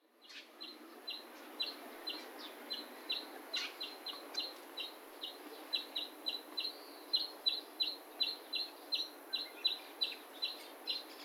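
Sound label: kawarahiwa 幼鳥, Oriental greenfinch juvenile; ohyaguchipark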